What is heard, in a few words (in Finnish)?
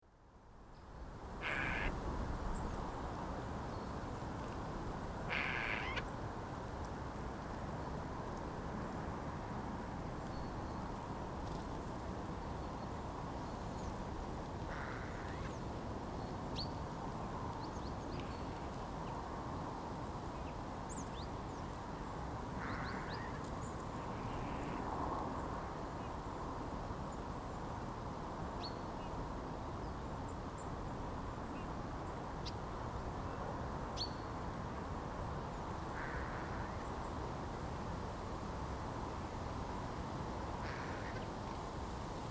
narhi, linnut